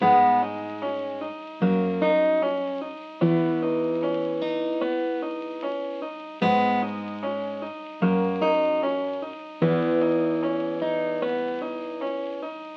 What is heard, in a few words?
Guitar